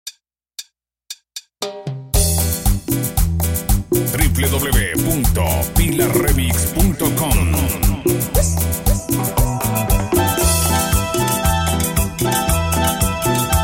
Perc Simple, 116 Bpm

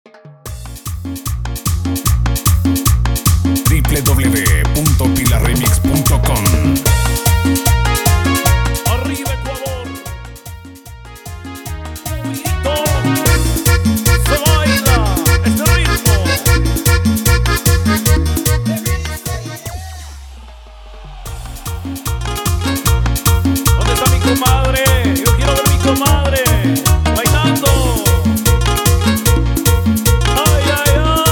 Electro Instrumental

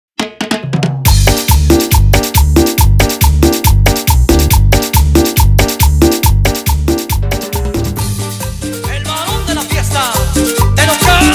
intro Bass BPM 139

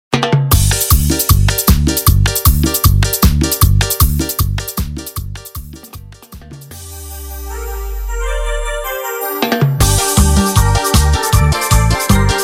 melody; dimax

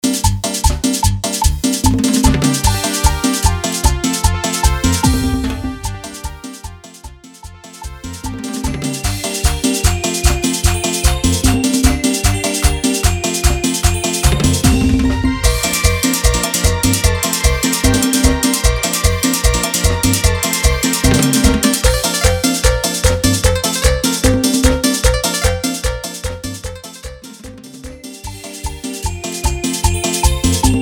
Instrumental BPM 150

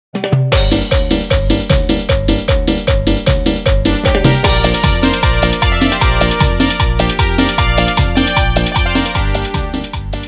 Instrumental Chicha Balada BPM 153; Dimax Dj Producer Ecuador

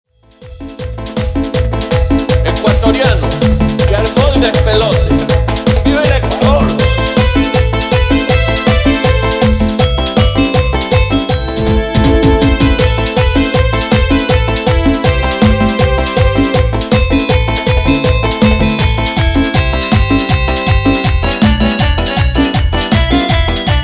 Instrumental Chichero, BPM 160; Dimax Dj Mix

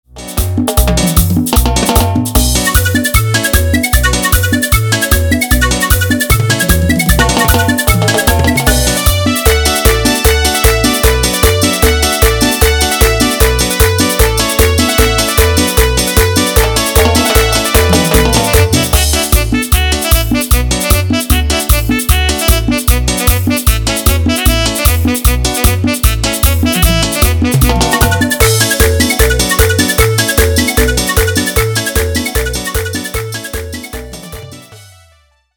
Instrumental Chichero Bpm 152; Dimax dj Mix

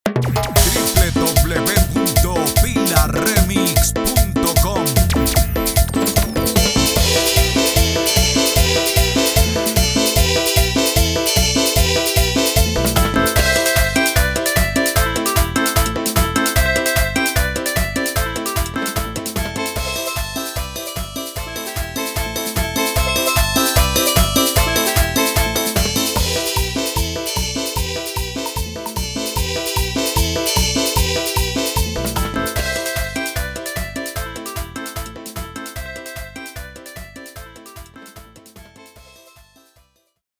Cover Instrumental, 150BPM

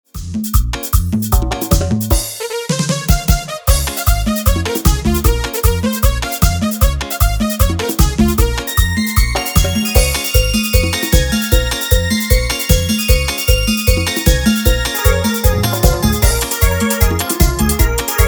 INSTRUMENTAL BPM 153; DIMAX DJ MIX; SIN SELLO

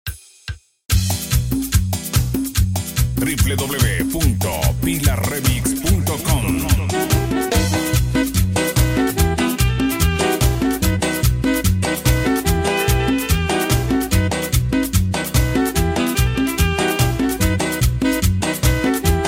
Intro Drums, 145 Bpm

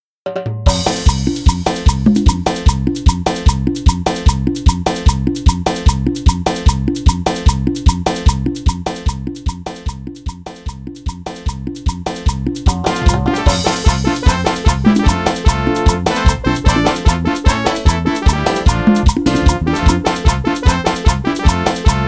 Intro Melody Acapela Bpm 150

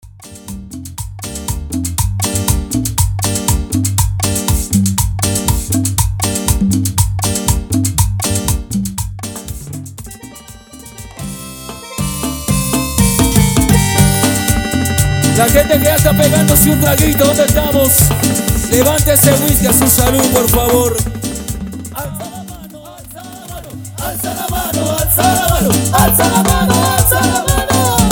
Intro Animacion 120 Bpm

